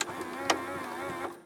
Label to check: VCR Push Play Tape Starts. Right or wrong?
Right